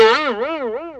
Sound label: springy spring